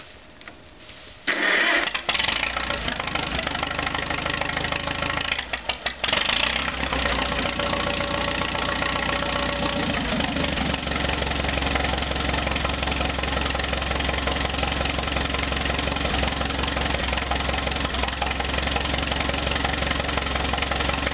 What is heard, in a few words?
Tractor, antique tractor